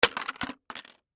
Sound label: Plastic Crate Stacked Drop; Drops; Objects; Blastwave; Foley